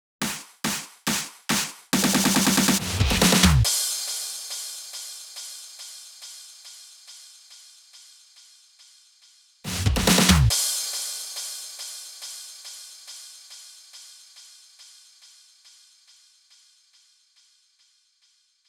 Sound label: 140 BPM Drum Fills; Vengeance Essential Clubsounds Vol.5; VEC5 Demo Constr Kits; Nessi Kit; Root A; Drums & Perc